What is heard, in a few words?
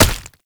Proto Infested Step; Enemies; Steps; Warframe; Brachiolyst